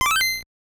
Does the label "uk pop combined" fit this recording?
No